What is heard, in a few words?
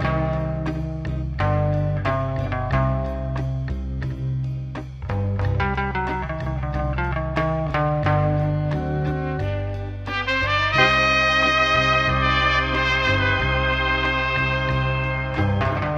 guitar and horns; loops; Phonky